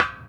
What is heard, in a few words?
Bust, percussion; HOUSTON; Hermann Park Bust of Jose de San Martin